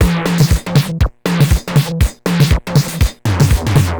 Distorted TR 505 (120BPM), beats; musicradar; cyborg beats

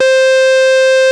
Analogue hits and octaves

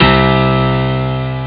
piano string; ST-XX Amiga Soundtracker Sample Packs

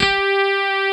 HARD RHODES; Pro-Rec - Synthsonic

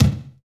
BD, Drum & Bass, 160 BPM

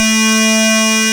RAVE BEEP; Best Service Alpha Dance vol.2

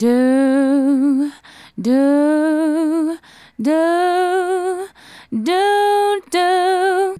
Female Solo, Hip-Hop and RnB Vocals

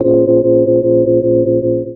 Rhodes Tremolo; Jungle; Keys; Zero-G Jungle Warfare 1, 2 & 3; 90 s Sample CDs

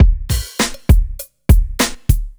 Club Tip, R&B Drums; Sonic Foundry (Sony Creative Software)

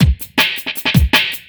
Beat, Futurist Drum & Bass; Drums & Percussion

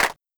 Simmons Clap

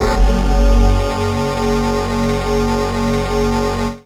syn, f#1, Synclavi, FAIRLIGHT; Best Service ProSamples vol.55 - Retro Sampler

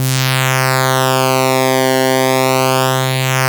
saw ring mod, pads; Zero-G Ambient 1

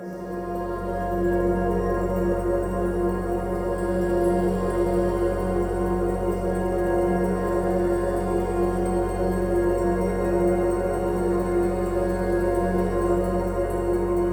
chadpad

